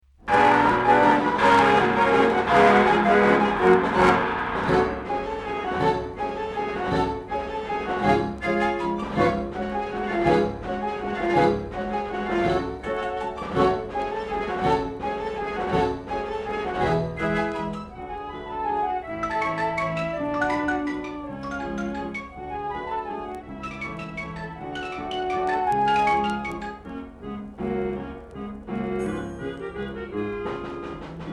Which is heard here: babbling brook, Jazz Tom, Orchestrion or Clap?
Orchestrion